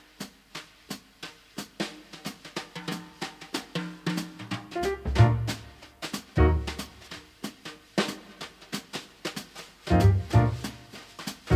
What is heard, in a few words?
Gentle Forest Jazz